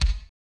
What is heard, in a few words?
deep bass, Percussion; Giga Samples Collection; EMUSP12